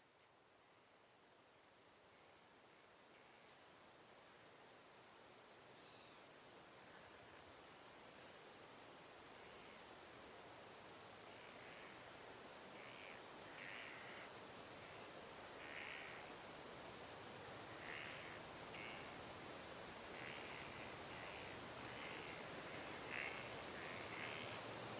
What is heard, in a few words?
haitian soundscapes; by zlatko cosic